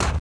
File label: Desert Step Right; Movement